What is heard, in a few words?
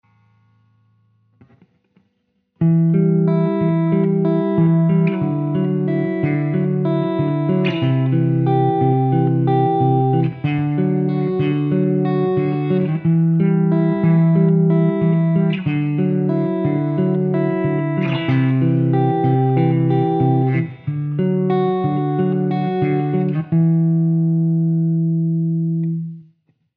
open chords example